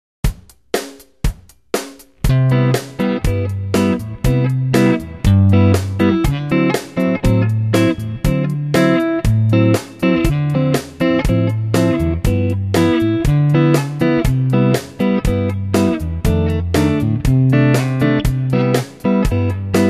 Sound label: Rhythm Guitar